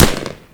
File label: rifle fire; nod